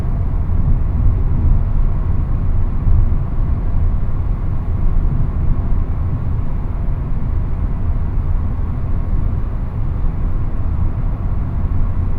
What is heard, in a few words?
Mid Size Car 130 binaural, Background Noise; TS 103 224 Background Noise Database